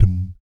BS DUM, Vocal Planet Jazz & FX; ILIO; BASS DUMMS

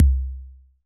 kick, Roland MC-909

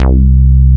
BASS C3 F; MR.J BASS

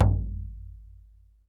BLACKFOOT, American Natives, Earth Tones; E-MU Formula 4000 Series Vol. 4

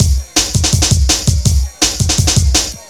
Breeze Break, Loops; m8 breaks

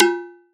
FX JUG BND; ModelCycles

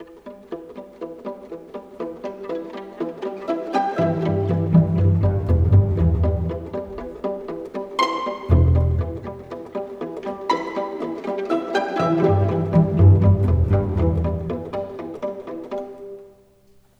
Cinematic, Orchestral Series; Sonic Foundry (Sony Creative Software); Orchestral Series VOL-3

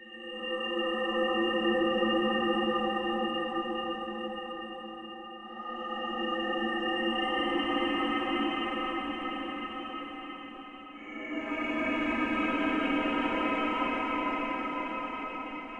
ambience, pad; starchild; M8

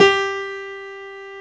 PIANO G4; PIANOS

